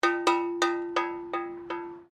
Bullock Bell Forged Ringing, Old cowbells from 1800's; Bells (Sound Effects:Musical)